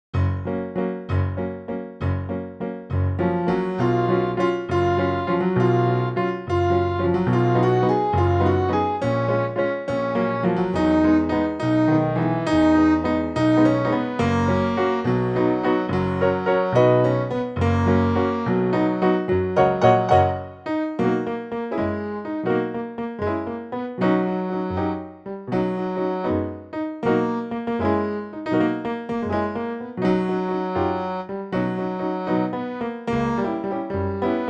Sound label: Audition Accompaniment